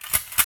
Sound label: Reload Laser Fire